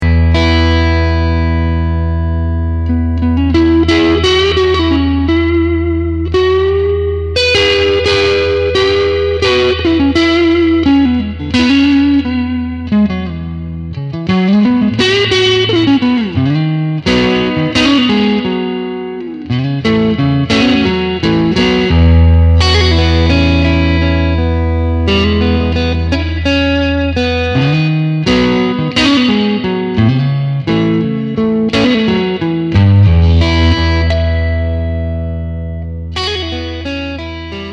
Vibro King; fender

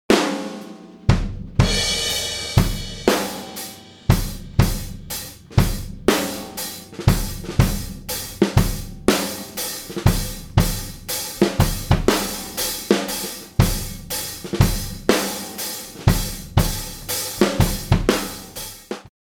drum track; Precision CL; precision comp limiter

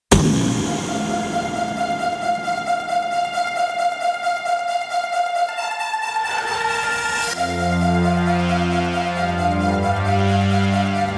Elektronika Trance Song; SampleTank 3 CS

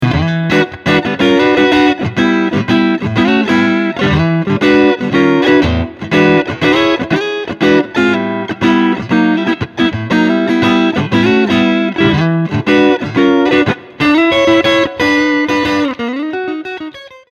Blues Vibroverb; fender

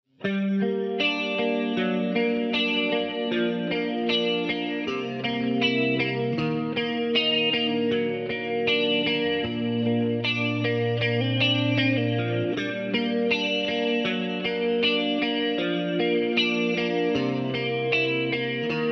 VIBE CHORUS; xgear

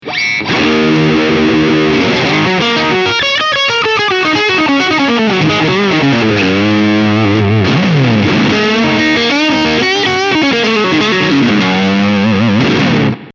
High Gain Super Sonic; fender

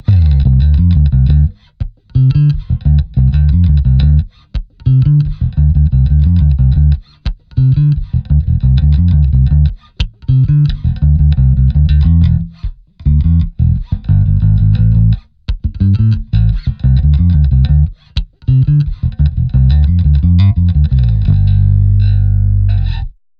bass control; QUAD COMP

